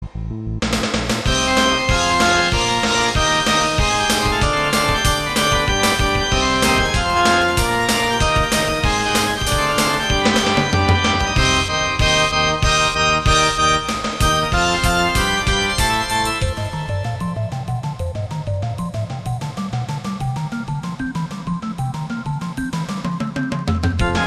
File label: fanfare